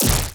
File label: accelerator Lightning; Mindustry